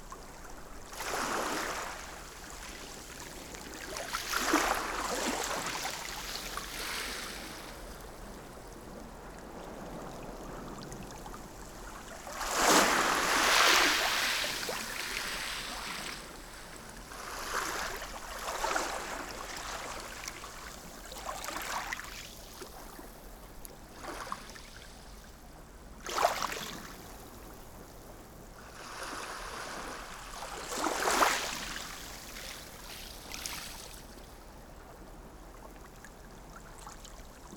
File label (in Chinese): 吹走吹散, 海浪聲